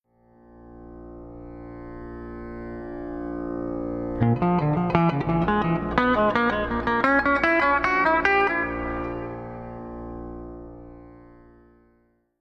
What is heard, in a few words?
Dim Run drone, pedal steel